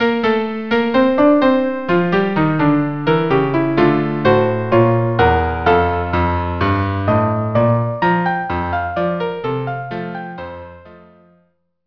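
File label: violin, cello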